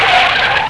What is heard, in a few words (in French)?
Virage; Tron 96